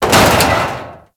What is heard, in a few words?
Arachnoid Camper Step Metal Debris, Enemies; Warframe; Corpus